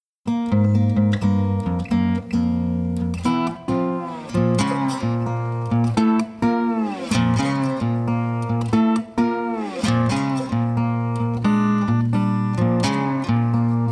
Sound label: Blues, harmonica